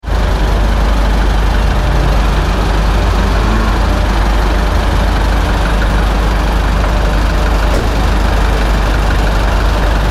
Taxi Rank, Fleet of traditional English black cab taxis in a rank.; Traffic and Cars